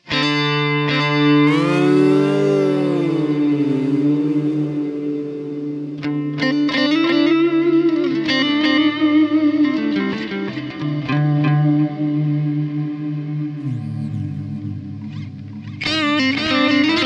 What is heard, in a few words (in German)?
EQD Silos Delay Analog Kontext Compressor Reverb; Cirrus